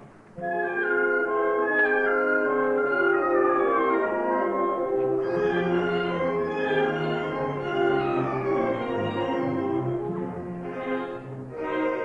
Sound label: armenian dance; giz galasi